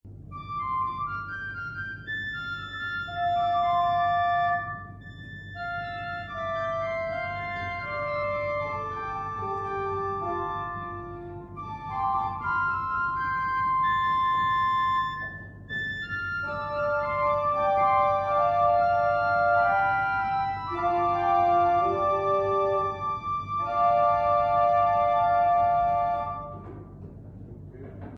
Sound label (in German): Orgel, pfeifenorgeln; Benson